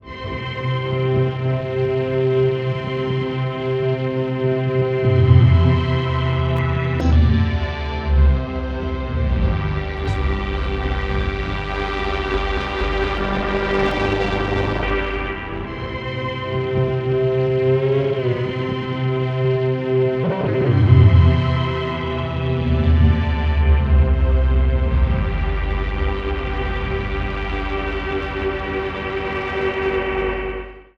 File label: fuzz chestra; Polyend